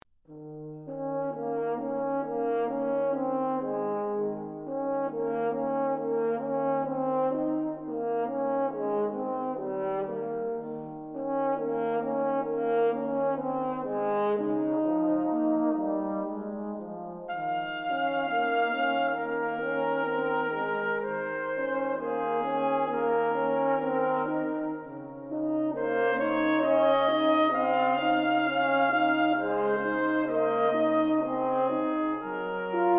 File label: Trio for Brass Berceuse